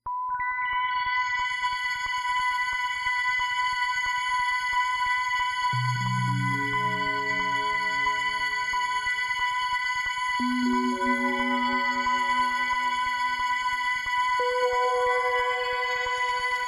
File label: sparkle drone; chromaris